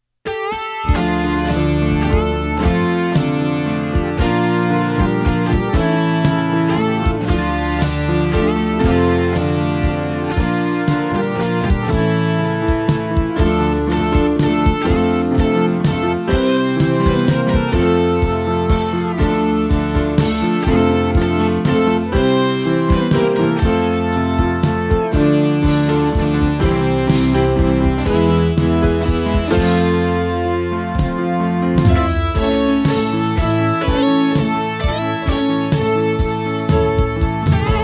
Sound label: inst